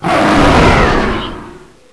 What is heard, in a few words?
boss voice